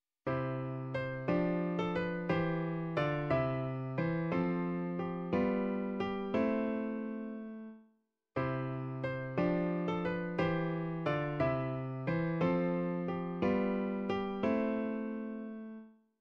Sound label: major interrupted; Grade 8